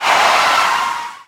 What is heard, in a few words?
brake, car; Cars; XBOX